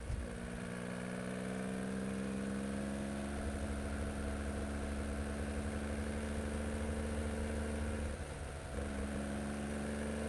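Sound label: porsche 911 onboard fast drive engine left; dpa4061; Sonniss GDC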